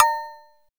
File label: PLASTIC COW; TEK PERC2